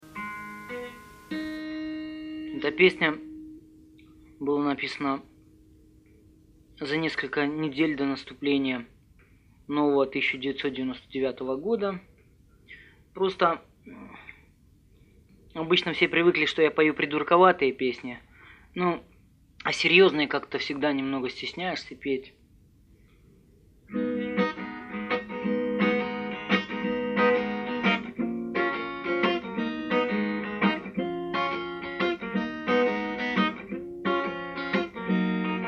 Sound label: unplugged